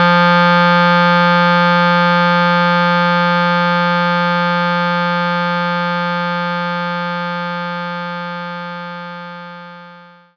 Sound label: clarinet F3; knights try